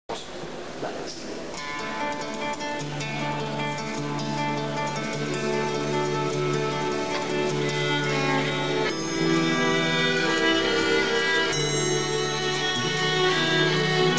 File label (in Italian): Introduzione musicale, Nuvena; paraliturgici; coro città di trapani